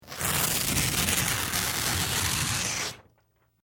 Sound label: Paper Rip Foley Tear Thick; Materials; BBFX